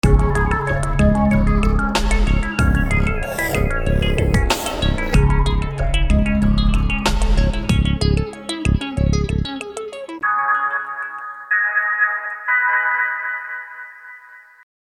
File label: jingle; Jingles medley